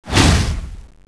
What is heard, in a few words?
Pollarm Pull; Knight Online